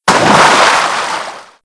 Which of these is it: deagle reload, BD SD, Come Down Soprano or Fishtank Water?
Fishtank Water